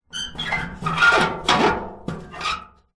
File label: industrial toolbox metal large empty squeaky rusty handle movement